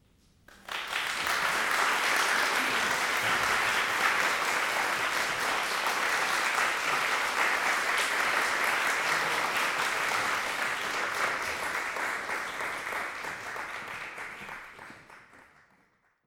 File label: applause